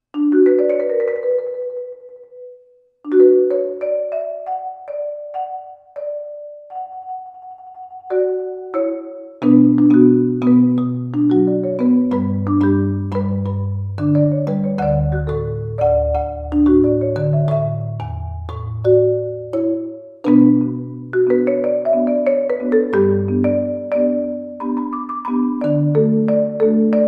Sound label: Marimba Cover